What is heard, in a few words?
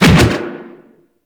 Fist Whoosh; Warframe; Weapons; Archwing; Melee; Regor Fists